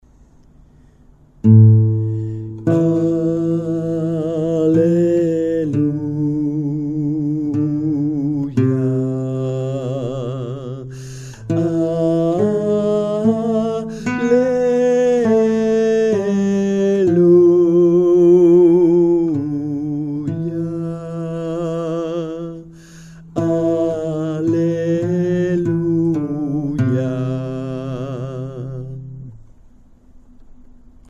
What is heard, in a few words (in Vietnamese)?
Hy Lễ Phục Sinh, bè Sop